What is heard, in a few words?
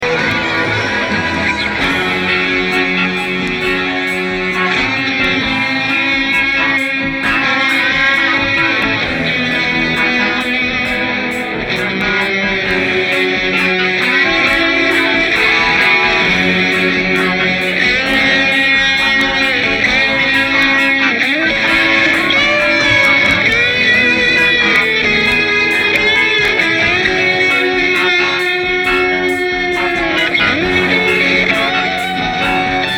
solo reverb mix; Pulled from 5.1 Mogg Mix